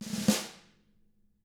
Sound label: snare roll